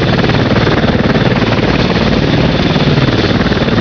Heli loop; Chase Hollywood Stunt Driver; XBOX

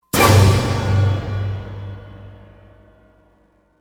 crash; Soldier Of Fortune II Double Helix; XBOX

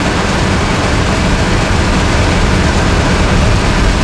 uk bus engine loop; auto; Tony Hawk's Project 8; XBOX

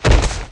Ice Beast Thud and Slump, Aliens

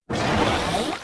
start, ships; Quantum Redshift; kukai

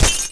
bullet impact glass

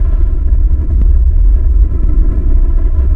fast, ships engine; Quantum Redshift

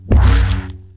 saber death

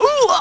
fall; Splashdown; XBOX

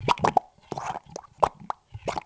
tar; Ambient; MX vs ATV Unleashed

